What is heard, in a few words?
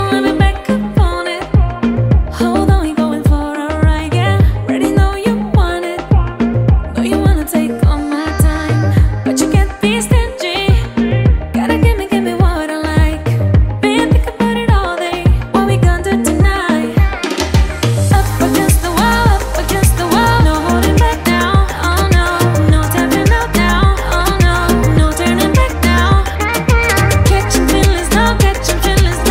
Hollywood Ringtone